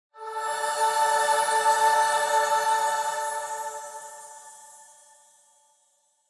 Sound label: Angel Chime Low Long